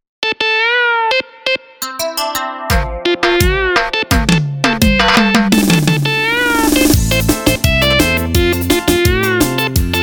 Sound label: melody remix